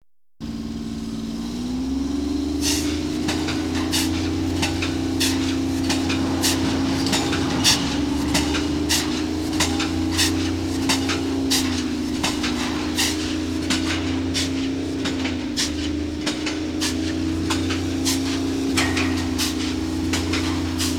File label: Audience Applause